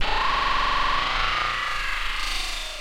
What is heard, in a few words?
Jungle Fx Sound